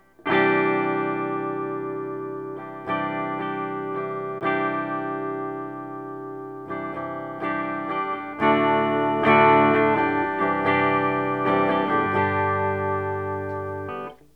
stereo test LRC

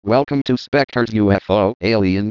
aaaaaaaaaaaa

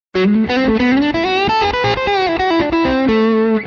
lick

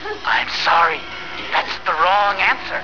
wrong answer

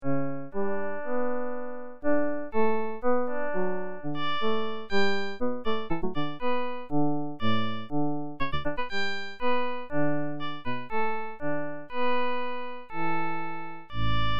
noise

